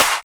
Claps; Vengeance; Essential Clubsounds Vol.4

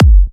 F#, Kicks; markus hakala

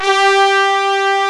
BRIGHT HOR G3, EII HORNS; East West - Global Sounds

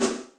Electric, Electric Snare, Yamaha DTXpress

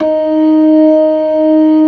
ORGAN, LOWER MANUAL

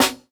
Snare, JUNGLE BREAKS; ORIGINAL JUNGLE BREAKS; JBK DRUM SOUNDS; JBK SNARES

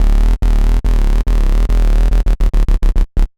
Bass, uk garage, 142bpm; Szeet

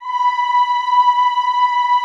EHH B4, Classical Choir; Best Service

